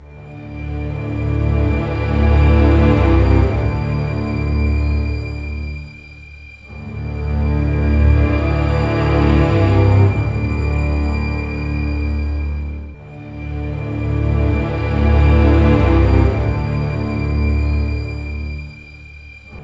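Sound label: 72 danger, orchestra; East West; Scoring Tools; Danger There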